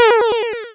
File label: Cry